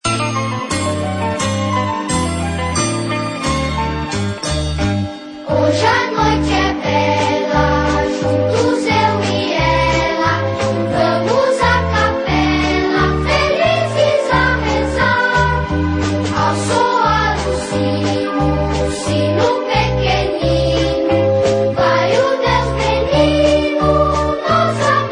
Coral Infantil Bate O Sino Pequenino; Natal E Ano Novo

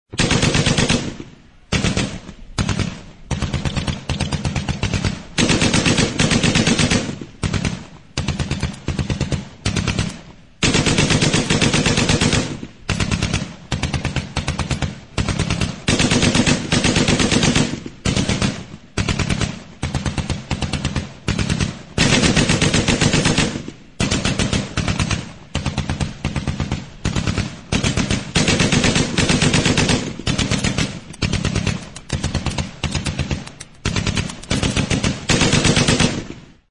Metralhadora

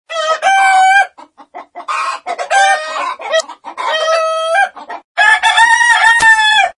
Galo De Manha, Vinheta

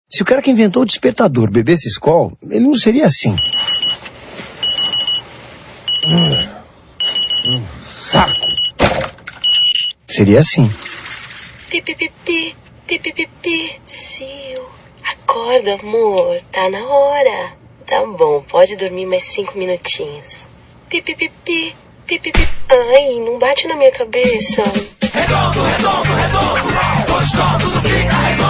Despertador, Vinheta; Skol

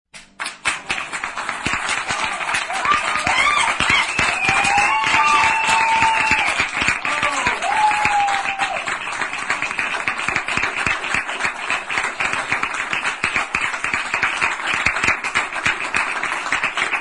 Palmas Vinheta

